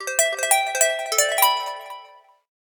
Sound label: SMS Simple no delay; SKY IM-A760S-VEGA-Racer; messagenotify